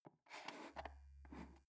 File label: foot switch dimmer slide up slow